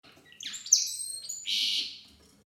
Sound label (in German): Voegel